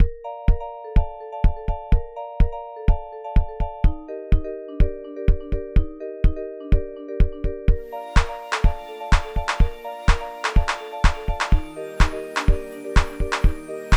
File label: Ibiza AM emo 125 bpm, Old Skool House; Big Fish Audio; OSH Construction Kits